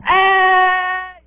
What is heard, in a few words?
ehhh